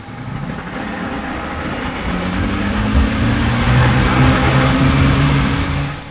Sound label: boat motor